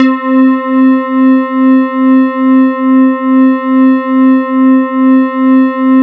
E-HARP, DX HARP; Wizoo - Powered DX

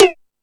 Hip House, drum machine

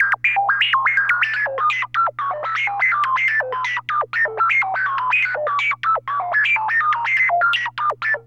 NOISE, SYNTH SEQ; AMG; Global Trance Mission VOL-2

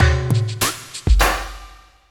HALT LP, Industrial Loops; 98 HALT

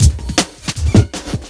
LOOP FX, Big Beat Drumloops

